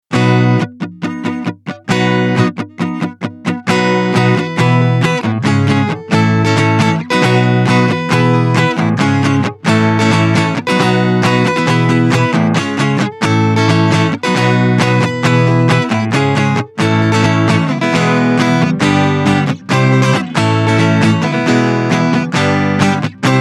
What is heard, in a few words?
guitar no clipping